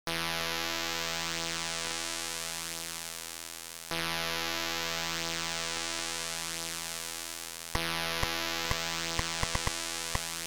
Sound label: Title Beeper; Firefly